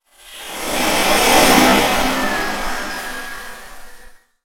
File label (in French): souffle